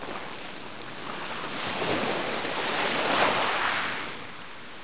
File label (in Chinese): xiao feng hao sheng yin